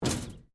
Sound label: Metal Plate Impact; Materials; Foundation